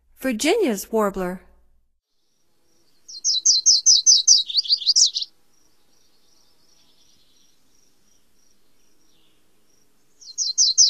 Virginias Warbler, Songs of Birds of North America; Stokes